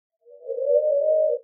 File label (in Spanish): vaca; st-gallen; gais; choir of species